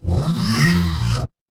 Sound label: mechanical servo